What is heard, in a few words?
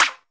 SYN FX, PERCUSSION; AKAI S6000 CD-ROM - Volume 5